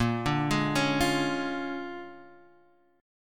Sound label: A sharp 7th Sharp 9th A sharp; arpeggiated; x,1,0,1,2,1; chord; JGuitar